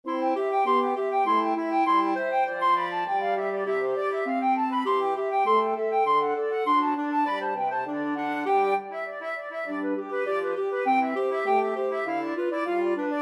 flute clarinet horn

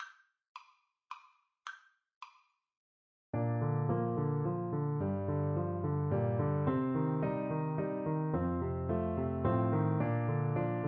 cader idris, Trombone

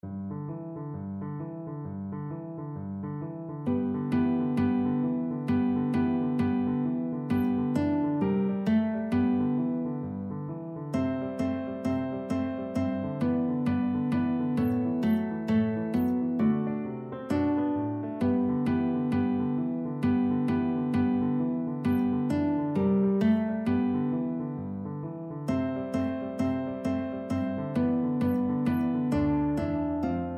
boogie, guitar; jingle bells

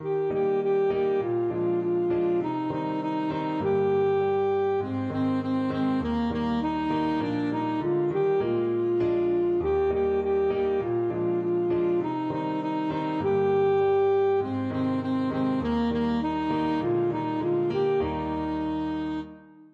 old st nic, saxophone

